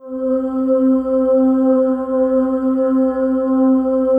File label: PAD, Drum & Bass, 160 BPM